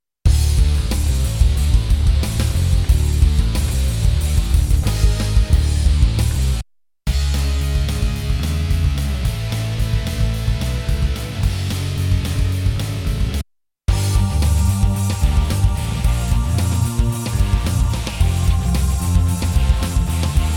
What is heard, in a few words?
Random Metal